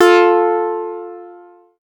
Synth Sound; Vengeance Samples; VEE Synth Shots

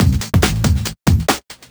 Breakbeat 140 BPM

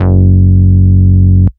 Ultimate Bass Root F#; Vengeance Samples

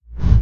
BD Gate FX, Bassdrums; Vengeance Samples

